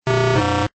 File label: failed, pda hacking